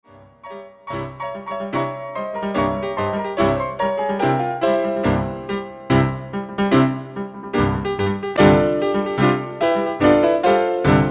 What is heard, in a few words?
bolero 2 pianos; ravel